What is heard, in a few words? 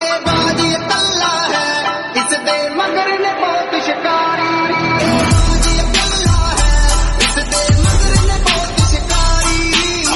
islamic